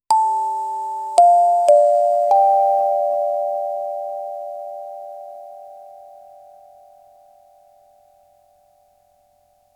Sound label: haptic sound similar to, vibration